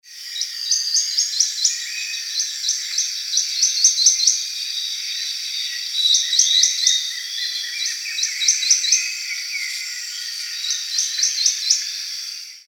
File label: Xenops minutus song; Ocampos